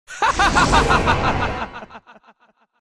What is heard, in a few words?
haha